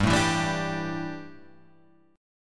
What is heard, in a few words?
D Suspended 2nd Suspended 4th G